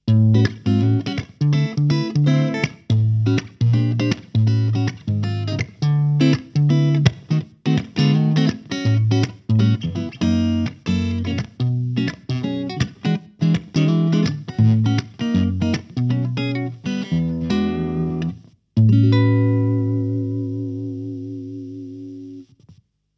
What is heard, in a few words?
JAZZMASTER Jazz; Fender AV II 66